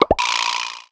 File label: cartoon rattle